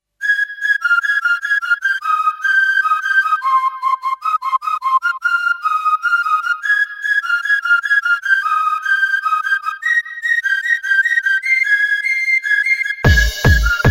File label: Techno